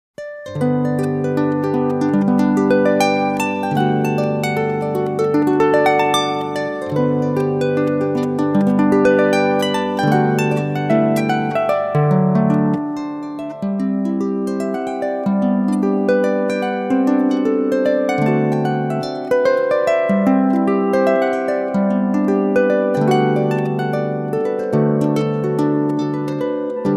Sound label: Irish Country Dance